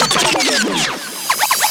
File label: Loops Fills; Vengeance Samples